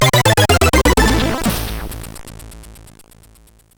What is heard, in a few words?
sid CSG8580R5; hvsc